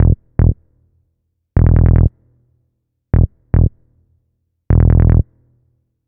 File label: Boosta Bass; Basses; Dr. Fink's Funk Factory